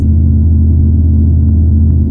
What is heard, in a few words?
tank engine; Tribes2